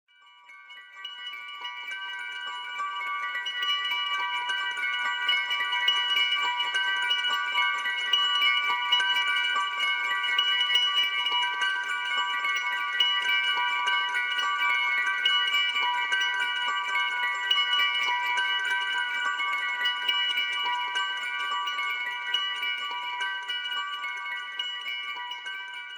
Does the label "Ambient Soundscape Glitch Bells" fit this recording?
Yes